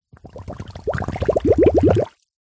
metal canister small submerge deep water bubbles; zapsplat

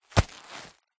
luggage leather handbag hit thud; foley

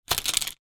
toy plastic handcuffs put down on table

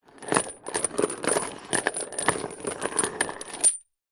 industrial chain medium sized movement on wood; zapsplat

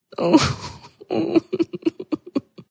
human FEMALE sustained cry sob

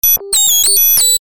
science fiction computer glitch or malfunction

